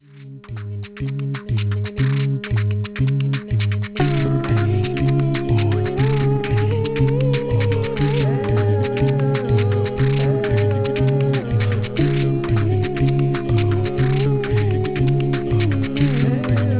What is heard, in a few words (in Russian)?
deti v vannoy 8bit